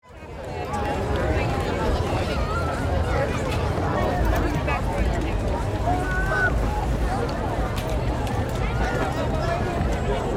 Crowd Walla, Ambience, Crowd, Walla, Outdoor, Movement, Walking; Crowd Sound Effects